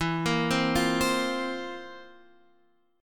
F Major 7th Suspended 4th E, guitar chord

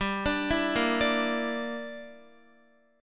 G Minor 6th, Guitar; arpeggiated; 4E,4A,5D,5G,5C,6F; Gm6; x,10,12,9,10,9

